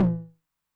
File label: HI TOM, High Tune Med Decay, 909; classic machines